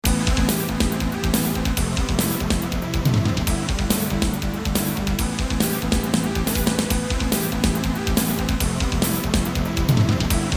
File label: music victory; wolverine